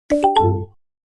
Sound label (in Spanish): Tono de Mensaje Sonido; Messenger